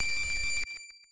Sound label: chime; chime sharp